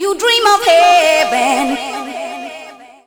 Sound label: Heaven Vox - 4A; Jungle; Vocals; Blu Mar Ten - Jungle Jungle - 1989 to 1999 Samplepack